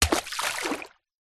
foley wood stick large through into puddle water splash